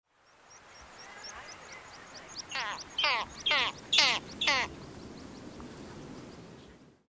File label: great skua